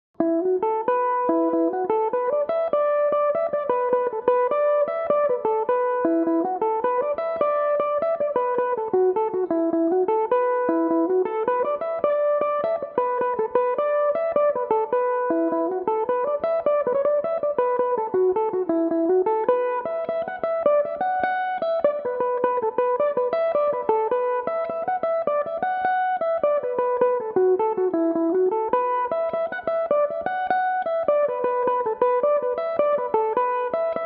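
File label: E dorian, mandolin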